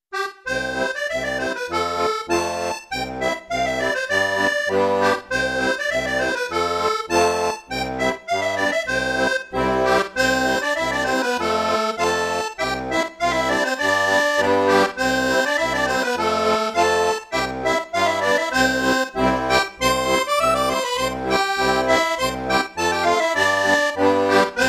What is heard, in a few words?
scottish dance; irish